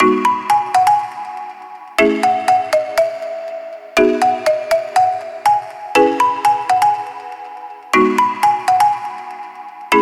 Catchy Melody Classy 122BPM; nikos ultimate beatmaker midi pack

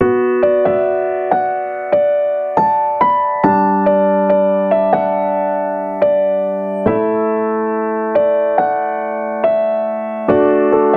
Pop Melody D Maj B Min 140 BPM, piano; nikos piano sample pack

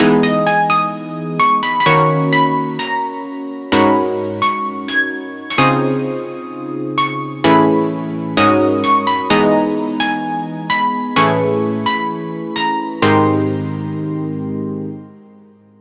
Melody 129BPM; nikos ultimate midi pack

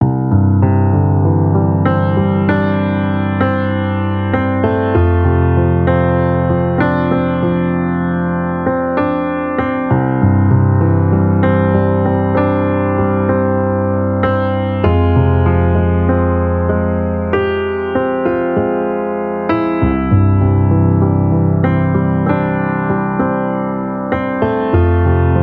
Pop Piano Intro Rise C Maj A Min 95 BPM; nikos piano sample pack

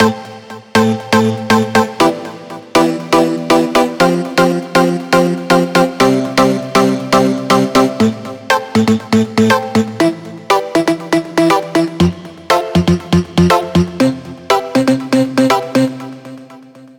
Rhythm Chords Vibe 120BPM, piano; nikos ultimate midi pack

